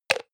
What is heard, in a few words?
hygiene small cup close hard, badezimmer